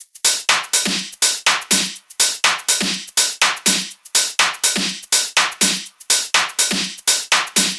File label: tops 123, Drum Sample Pack Loops Top Loops; SD SEISMIC; Slate